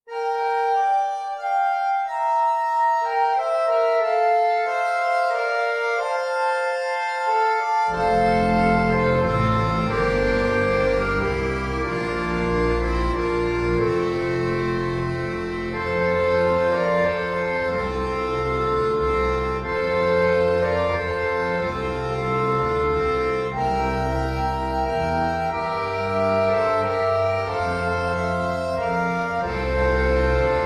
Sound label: Stille Nacht Trio Computer Sample